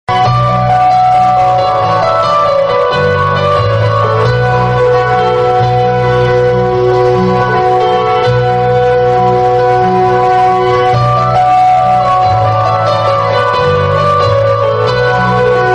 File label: sad tone; lalettan